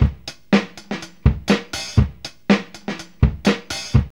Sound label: SKIP JUMP 124, Funky Elements loops; Zero-G